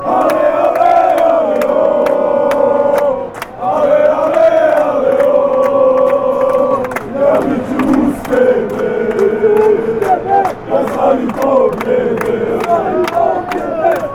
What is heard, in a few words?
montenegro chant